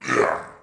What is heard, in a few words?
skeleton